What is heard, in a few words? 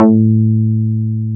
A2, BASSES